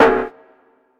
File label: long tail reverb steel snare drum sound A key